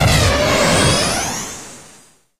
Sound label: bumper car speed boost start; tf